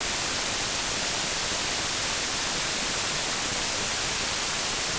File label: flanger